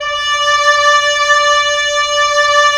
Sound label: WND OB F, Oboe f; Roland - LA Composer vol. 4